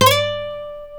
D 5 nylon, Guitars

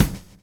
116 groove; AMG Gota Yashiki Groove Activator

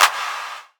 80s Clap

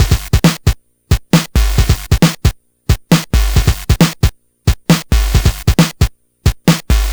Game Boy Break Loop, 8-Bit, Drums

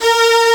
Strings C2, mt-32

